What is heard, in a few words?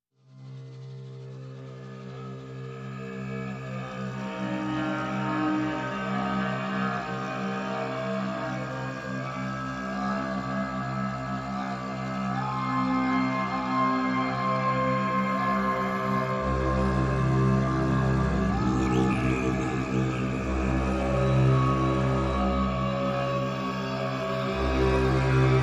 Ambient